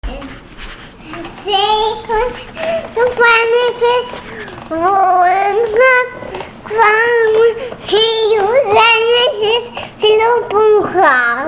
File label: singing